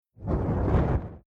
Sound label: Whoosh Fireball Flame Ignits Burns From Left To Right; Battle Sound Effects